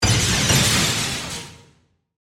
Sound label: Hit Metallic Burst, Debris; Production Element, Imaging Element, Accent, Transition